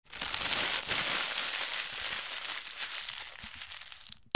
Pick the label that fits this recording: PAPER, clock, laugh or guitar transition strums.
PAPER